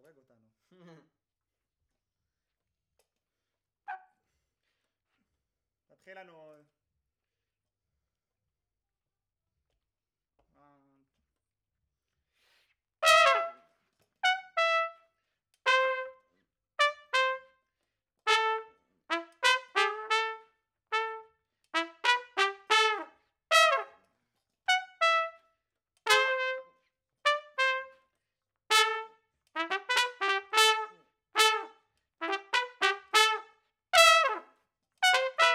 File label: Trumpet Room